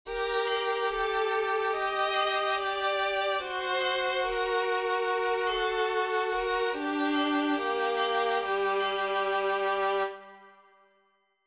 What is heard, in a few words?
game over